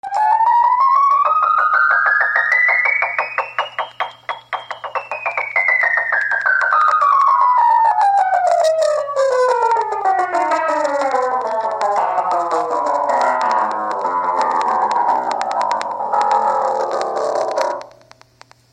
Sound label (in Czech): rozladěné piano